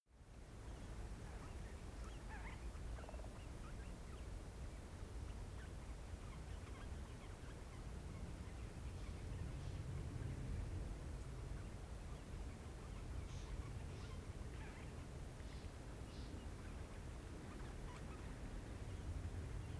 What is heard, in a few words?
african bush midday birds idle